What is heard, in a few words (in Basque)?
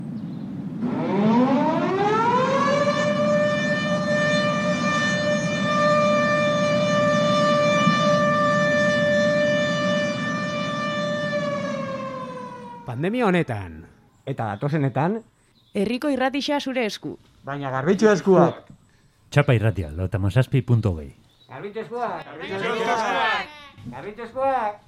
sirena